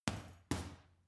boxing punching bag uppercut double soft

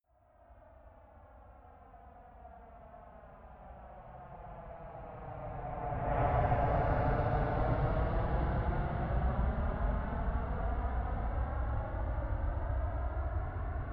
military jet flanker-c turbine flyby fast distant

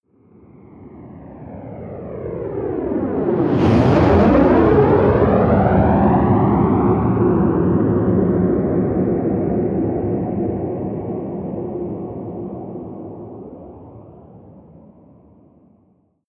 military jet bomber flyby whoosh fast

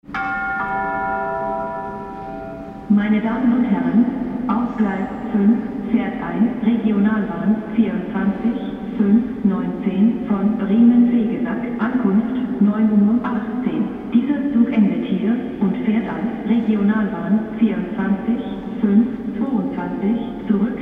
railway station german

